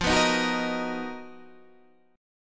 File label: B Major 7th F, chord; Bmaj7/F; strummed